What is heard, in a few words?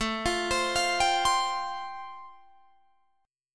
A-Minor 7th Sharp 5th, Guitar Drop D, chord